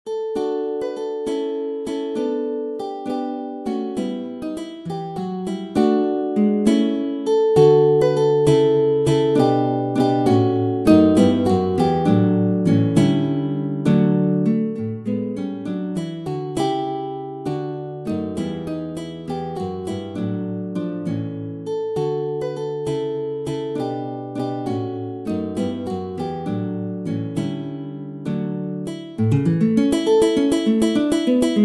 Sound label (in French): Larghetto espressivo, Méthode pour la guitare; Ferdinando Carulli; Op. 241; Classical guitar